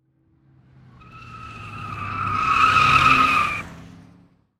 Transportation Car Race Car Drive By Curve Short Tires Squeal